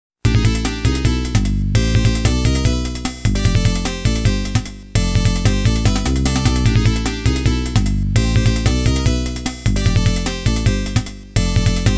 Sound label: FM Sound - Staff Roll; Monster World Complete Collection; Monster World II